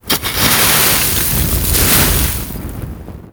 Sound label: Smithy Forge Fire Coal Rake; Soul Plane